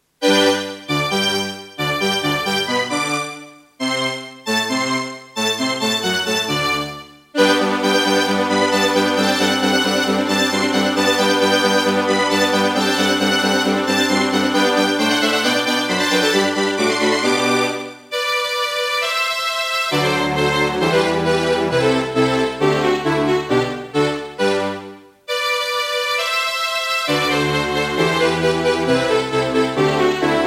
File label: Pine PT-2030 Vivaldi Xtra Wavetable DB; classical; Mozart; Eine Kleine Nachtmusik partial